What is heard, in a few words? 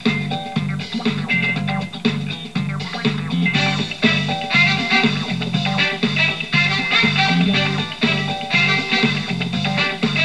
theme tune